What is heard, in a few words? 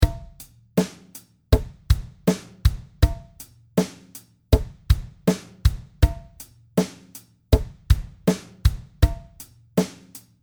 interlude 80bpm